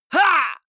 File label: male fight shout; vocals; comedy-cartoon; soundpool